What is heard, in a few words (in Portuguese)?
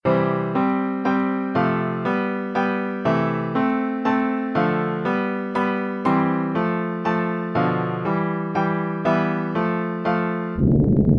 soneto para piano em do maior; coco